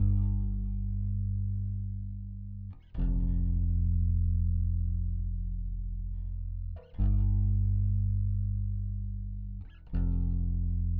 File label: bass